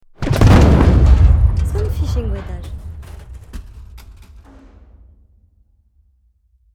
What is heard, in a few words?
explosion debris; space inviders; demo-explosion-debris